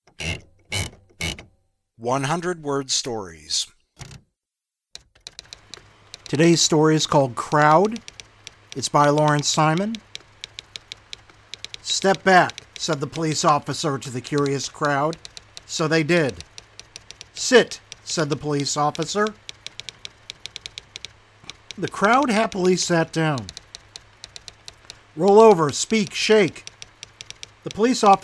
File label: crowd